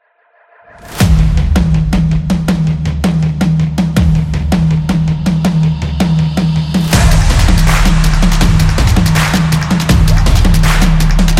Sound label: Energetic